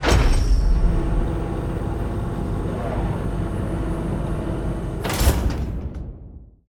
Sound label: crane working